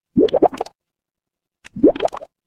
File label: water bubble